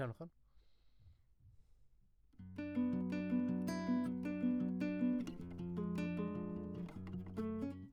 Classic Guitar

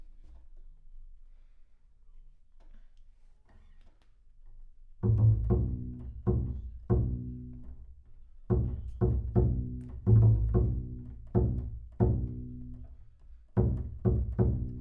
Bass Room

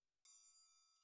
TRIANGLE CLOSE

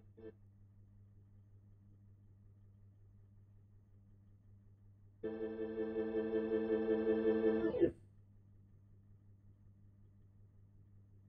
Hammond

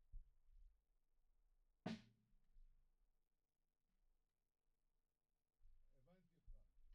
Kick In

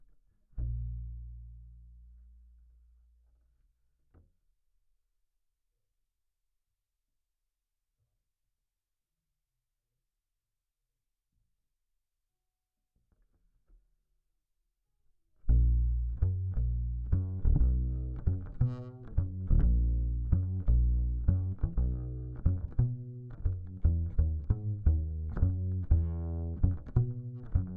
Bass DI

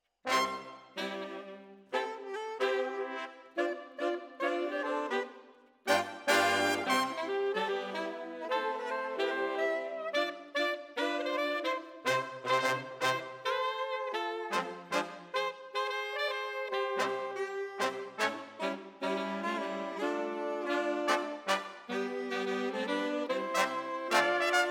Brass

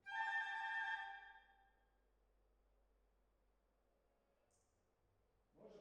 Hall, Jazz for piano

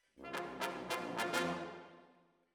ORTF, Jazz for piano

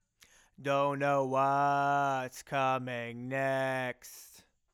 Chorus

